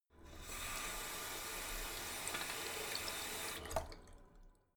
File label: tap flow light short, badezimmer